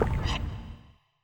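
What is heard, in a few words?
beholder damage up, monster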